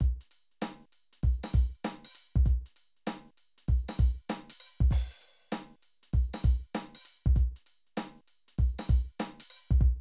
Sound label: Drum loops, Streetsoul; Zero-G Groove Construction (1993)